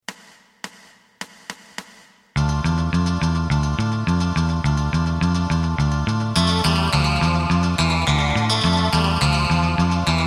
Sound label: drum